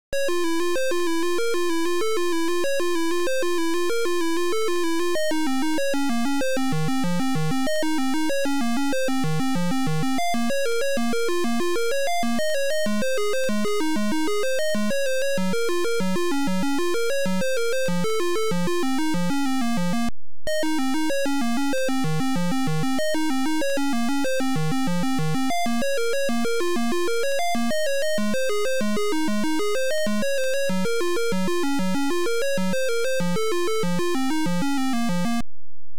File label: Title Beeper; Gunfright